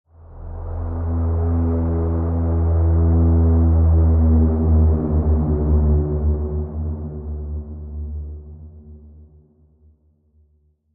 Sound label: Woodwind Bend